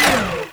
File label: minigun winddown; chicken fortress 2